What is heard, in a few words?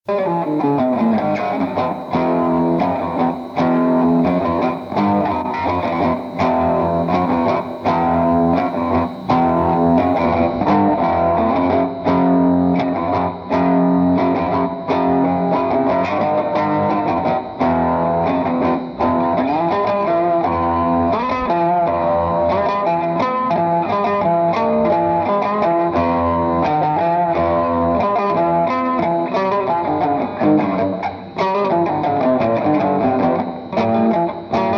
Riff Tapes